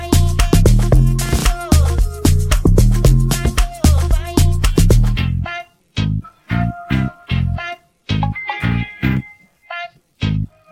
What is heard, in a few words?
instrumental